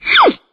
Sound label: whiz